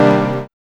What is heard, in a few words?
TEKCHD